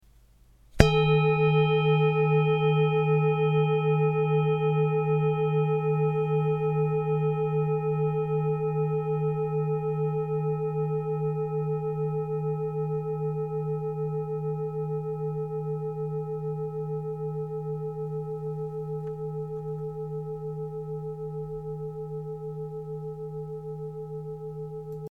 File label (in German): Klangschale